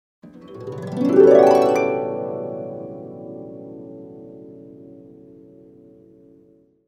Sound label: harp glissando